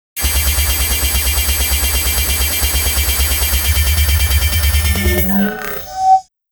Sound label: fast zigzag digital movem, electronic pulses